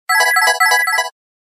Serene Tone

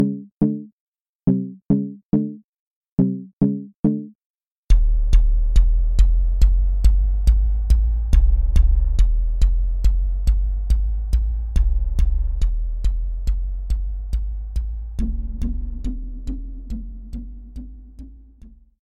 Bass thump

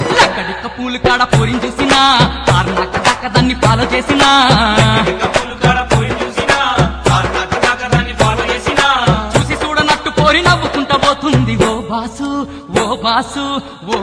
telugu folk teenmaar

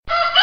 rooster; animals

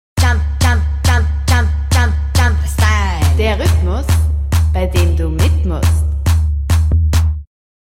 Jumpstyle Music

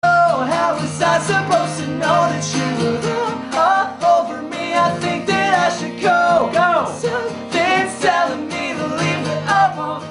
acoustic

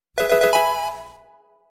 level up notification; pokemon

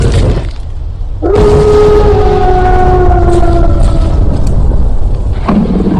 Werewolf Horror Howl

